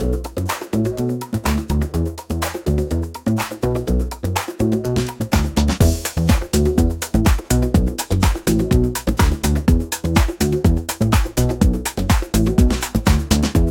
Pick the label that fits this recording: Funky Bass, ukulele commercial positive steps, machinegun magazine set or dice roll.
Funky Bass